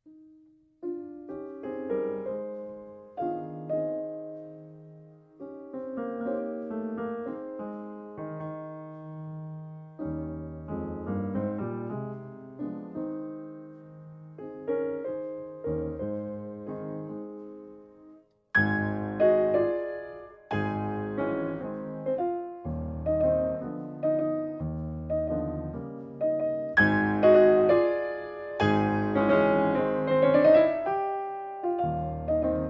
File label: Ballade No.3 in As-dur; F.Chopin